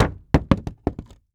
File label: Impact Object Small Hitting Solid Wood Drop Short